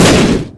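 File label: boss explosions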